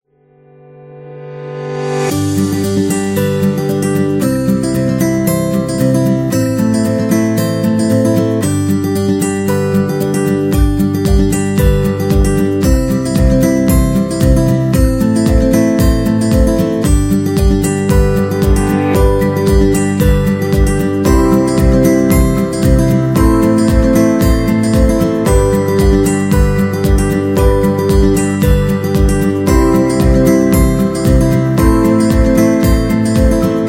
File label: Elegant Acoustic; happy music vol 7